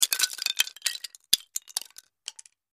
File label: Wood Shims, debris